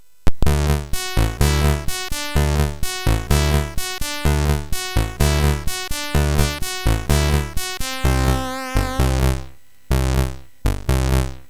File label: Melody, MOS6581R3; hvsc